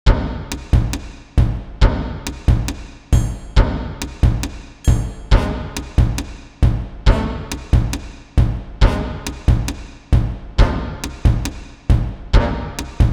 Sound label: action game theme